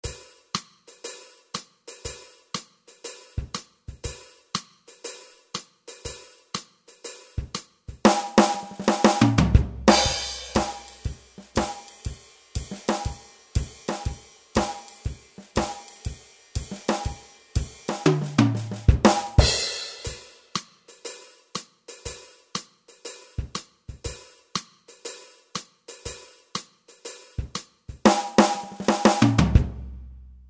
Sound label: DR-770 Big Band